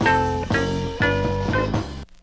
four hornies, stabs

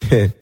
heh male, Voice; ooh ahh; heh huh

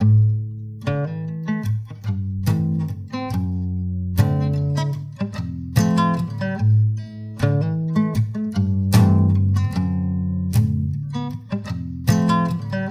guitar, Loops, Sad

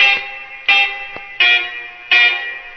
guitar

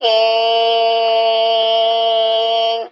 "being", Voice

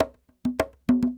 Drum loops; Just Add Drums

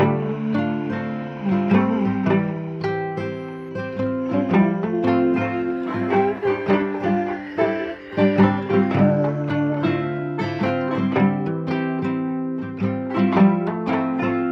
guitar voice, Sad; Loops

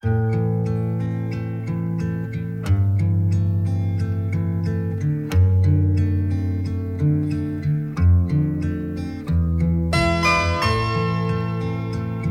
guitar, Sad

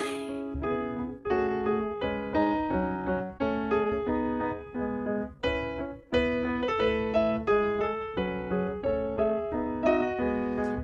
piano, Loops Sad

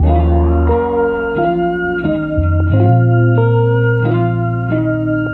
keys, jazz loops

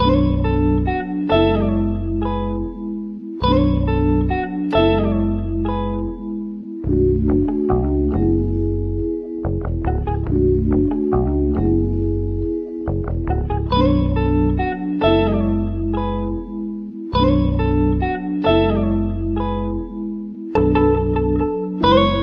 guitar and keys, Phonky Loops

